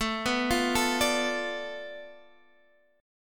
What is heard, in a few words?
B Minor 7th Flat 5th A